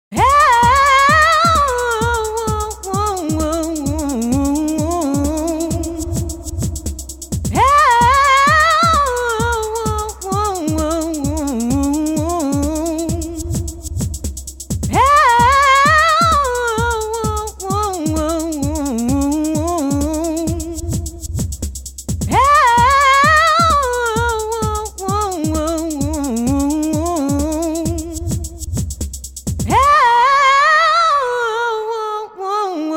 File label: REVERB PACK